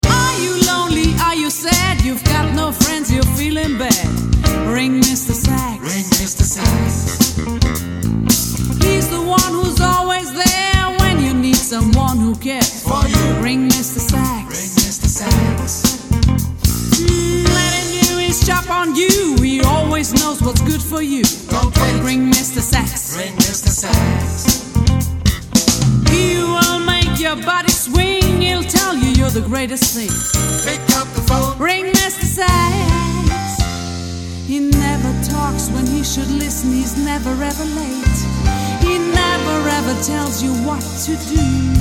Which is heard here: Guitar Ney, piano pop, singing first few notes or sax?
sax